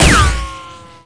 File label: hit bull